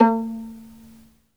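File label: SOLO VIOLIN, Synclavier Strings; ILIO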